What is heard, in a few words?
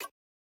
generic click